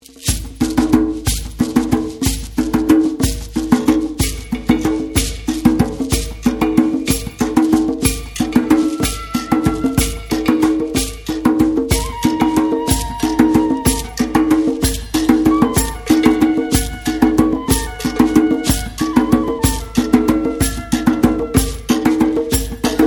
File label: Mystic Waltz